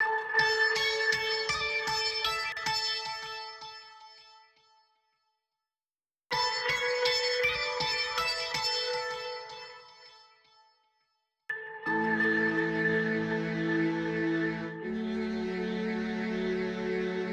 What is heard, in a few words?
Mix Phaser Solo